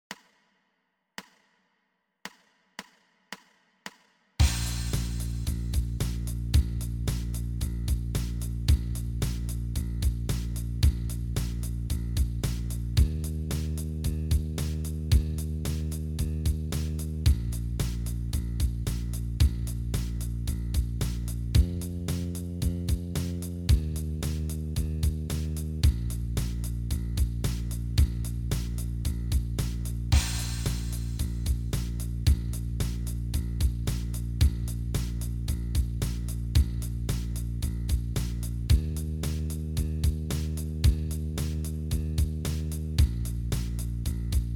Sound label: bass startup bb blues 112